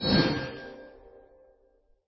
ui ping

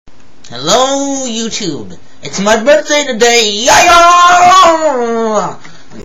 yeyuhhh

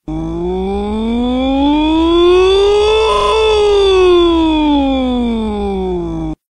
oooooooooooooooh

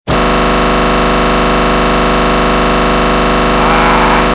click boom error

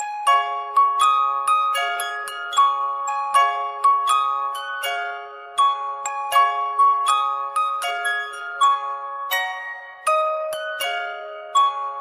pop goes the weasel music box version; laughing jack theme

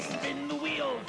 spin the wheel

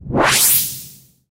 transition

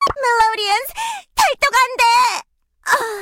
sad pin; brawl stars

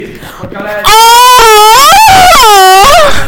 aahahhhhaaahhahahhh

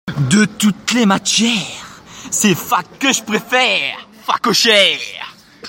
vocal

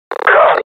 hit; combine

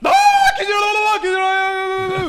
baaaaah

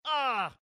death

